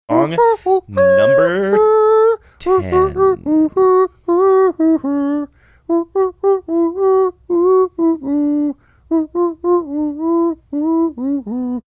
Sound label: hoo hooing song